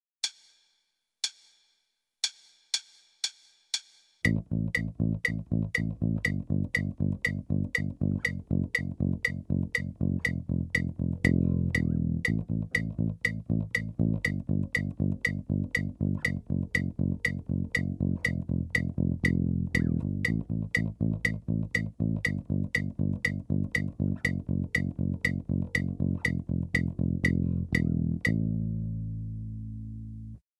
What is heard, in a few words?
Rock